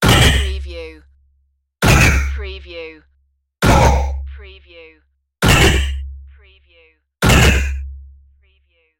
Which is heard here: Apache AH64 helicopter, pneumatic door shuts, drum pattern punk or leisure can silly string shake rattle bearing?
pneumatic door shuts